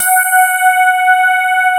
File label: sparkles